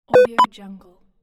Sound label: multimedia error notification